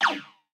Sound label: fire start; Cuphead; wideshot